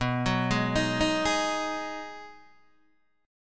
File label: B 6th B down Guitar Open D